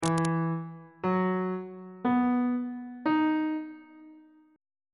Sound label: d double sharp major 7th suspended 2nd chord intervals on bass clef